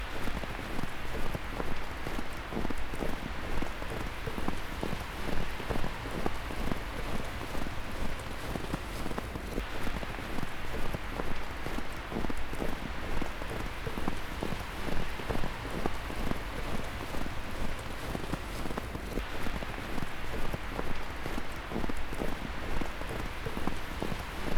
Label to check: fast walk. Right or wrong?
Right